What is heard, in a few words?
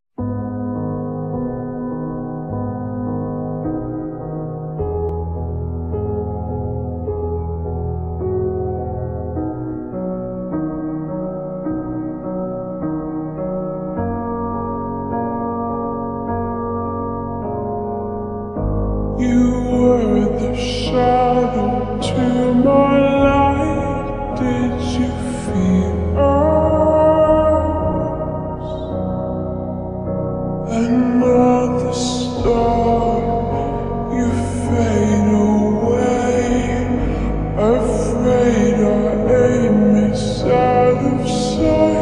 slowed reverb bass booster